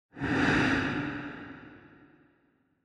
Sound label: Scene Flashback Short